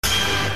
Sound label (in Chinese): 嘉宾亮灯; SweetParty; 狸猫新增; 相亲房